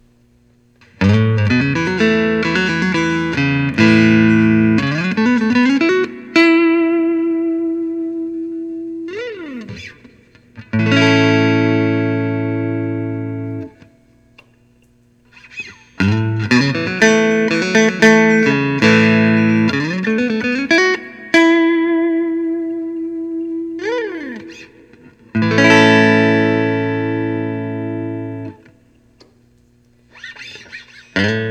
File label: fender jazzmaster; 1965